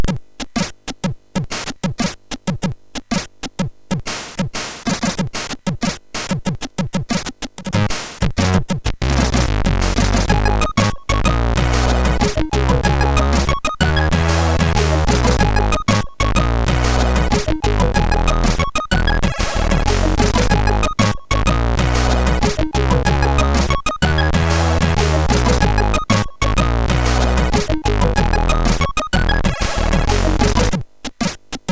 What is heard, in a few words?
Kinetic, MOS6581R3; hvsc